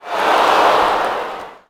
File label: Ooh, Crowd, reactions